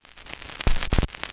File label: Record Start, Vinyl Record; Record Noises